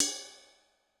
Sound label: Deep Cymbal; Yamaha DTXpress-II